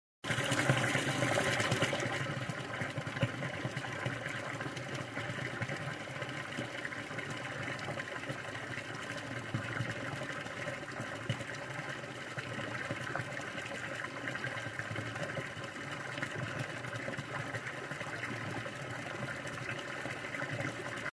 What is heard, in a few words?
Flowing water, Voice of Nature